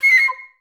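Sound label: flutes C6; Best Service; Peter Siedlaczek's Advanced Orchestra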